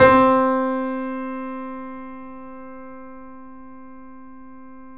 C, Piano; InVision Interactive; The Piano

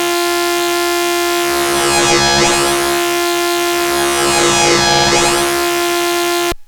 Monotron Sci-Fi

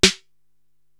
SD Distorted 88, Korg TR-Rack drum machine

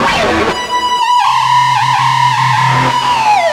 wild, special efx, guitar; East West; Steve Stevens Guitar Samples Collection

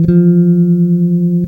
BASS OVER, Electric Basses; E-MU Producer Series Vol. 8 – Platinum Phatt

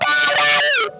OVER WAH, Funk Guitar; 90 s Sample CDs; Zero G

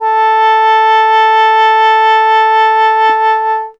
sheng a3; WORLD INSTR 2; WOODWIND AND BRASS; PS SHENG; World Instruments 2

